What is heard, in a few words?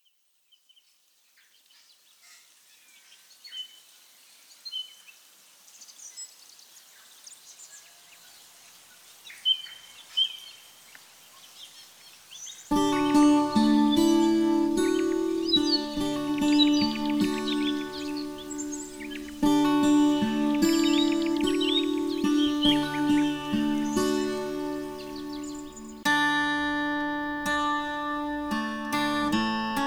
ambiance guitars